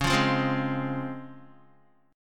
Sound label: C sharp Major 7th C sharp Guitar; C#M7 strummed; 8,8,5,5,8,x; JGuitar